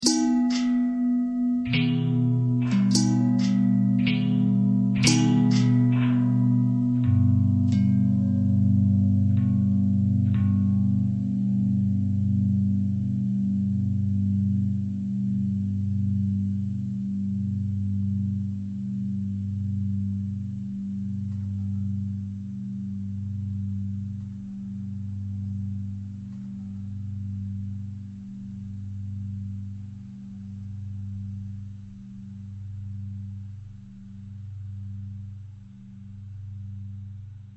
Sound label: long bell long bell thread pentatonic, bells